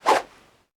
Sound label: slash; weiss